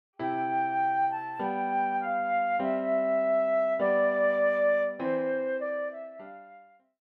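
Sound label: flute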